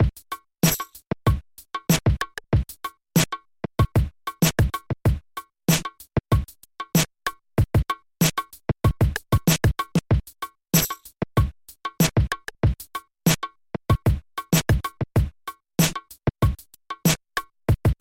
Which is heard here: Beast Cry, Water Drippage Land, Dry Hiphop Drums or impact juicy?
Dry Hiphop Drums